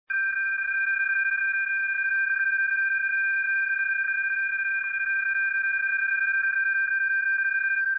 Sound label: PSK31